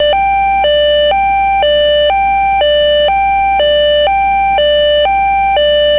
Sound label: new two tone